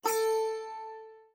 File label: Windows Ding; abbottabad compound